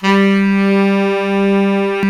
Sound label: SAX, Tenors; Giga Samples Collection